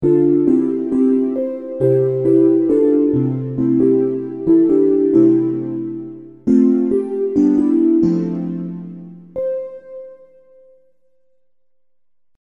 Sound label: a very short song